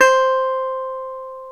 GTR Dobros National; Club-50 - Foundations Roland

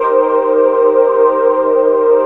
Korg, FX

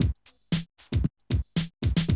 Classic Drum Loops

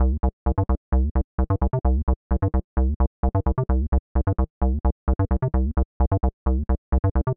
130 Cm bass, bass loops, trance

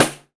PAN, ETHNIC PERC; Roland LA

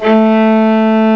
QUARTET, HISTRING

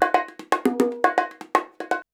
119 bongo

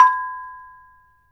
B5, GAMELAN SET; Spectrasonics; Heart of Asia

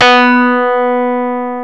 SYNTH PIAN; E-MU Formula 4000 Series Vol. 3; Analog Odyssey; EmuClassicSynths

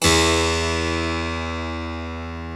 Harpsichord; E-MU Producer Series Vol. 2; More Studio Essentials; Keyboards

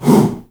HOO, SHOUT; Spectrasonics; Heart of Africa 1; CH. SHOUT1

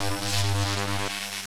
ARC SEQ, ELECT NRG; Total Control - Action Cutz

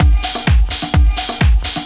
Latin Loop 128 BPM

